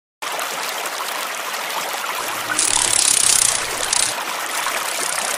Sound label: damselflies